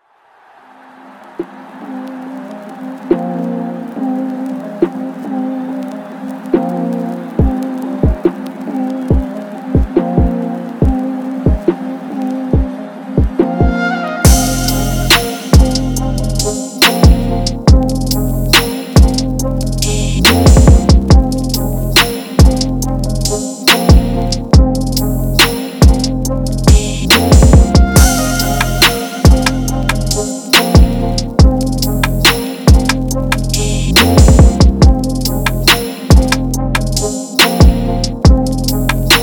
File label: trap beats